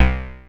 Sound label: OB C3 -R, Rare Synthesizer; Hollywood Edge - Giorgio Moroder Rare Synthesizer Collection; OB 4 VOX.